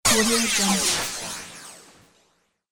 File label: zapping